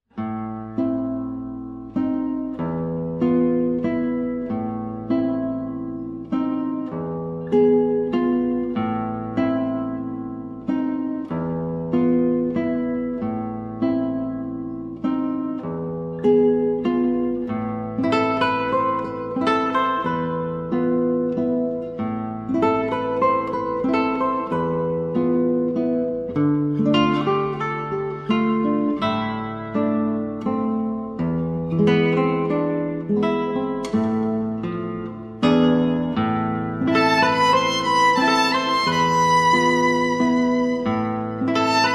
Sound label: duo violin guitar classical duet